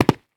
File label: Foley Footstep High Heels Dirt Debris Jump Quick; Foley Footsteps